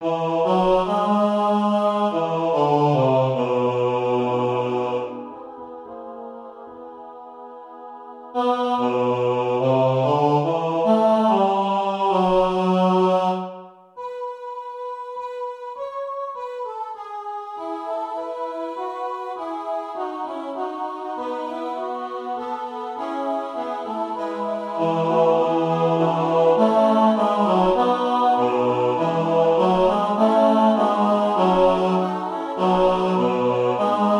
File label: Solo Bass